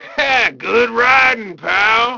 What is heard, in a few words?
bike; Grand Theft Auto Vice City; XBOX